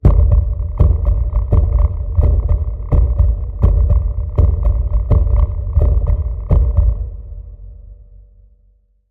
Low Deep Heartbeat

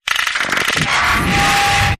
Not Human Alien Lifeform

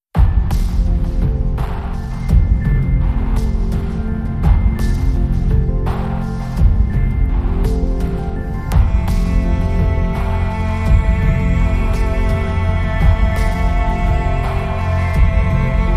Ocean Cinematic

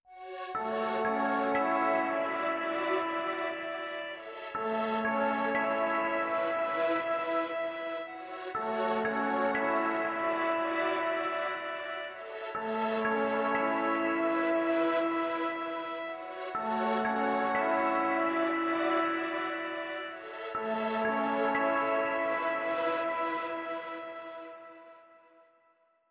Accordion Synths

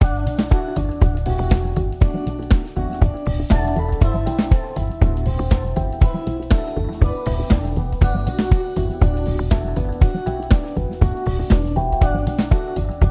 Positive Pulse

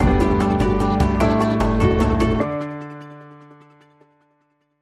Bumper

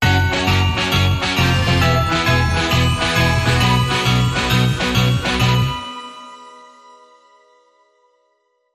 Rock n Roll Christmas No Drums Sting

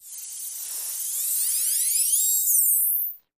Thin Piercing Buzz Transition

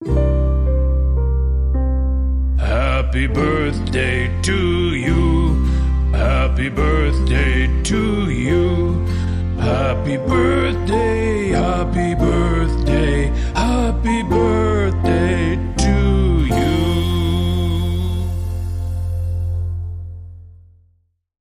Grandpa Vocal Happy Birthday

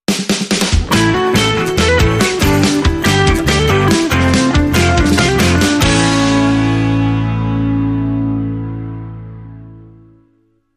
Motown Rock

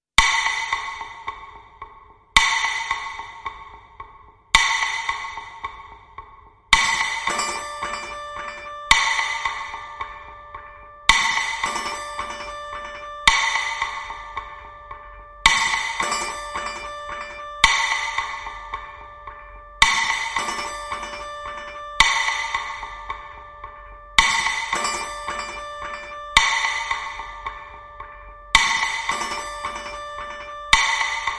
Hits Only

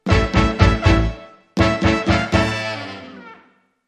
Hit

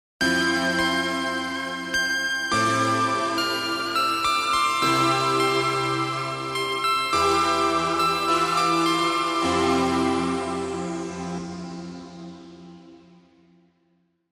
No Drums No Bass Mix

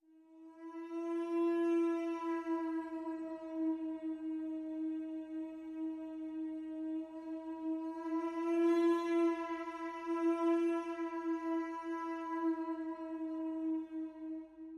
Madura Only

